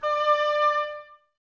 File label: OBOES D, Wood Winds; Miroslav Vitous - Woodwind Brass Ensemble